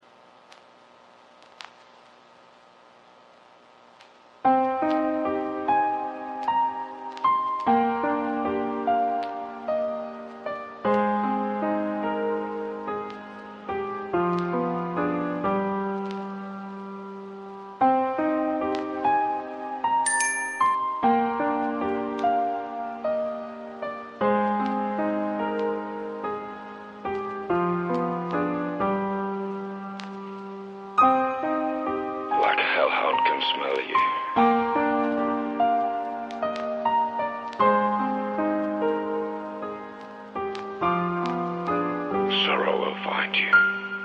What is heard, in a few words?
Instrumental, Rock Ballads